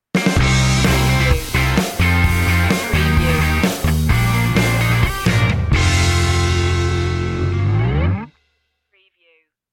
rock sitcom ident

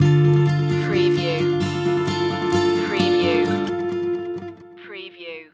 take my hand stinger

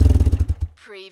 Car Motor Shut Off Engine Perspective

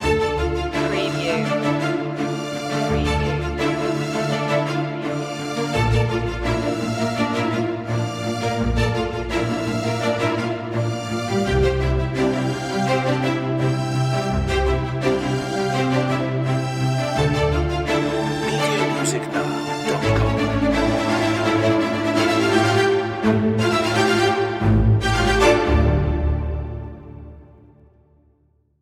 strings of passion

